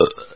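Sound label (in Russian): отрыжка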